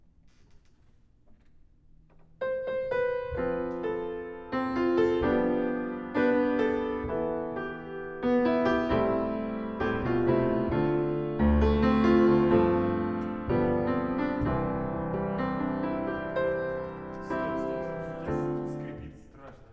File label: piano low